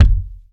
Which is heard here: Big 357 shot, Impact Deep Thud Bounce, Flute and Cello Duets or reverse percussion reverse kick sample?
Impact Deep Thud Bounce